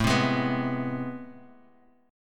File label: A Minor Major 9th, down, Guitar, Open G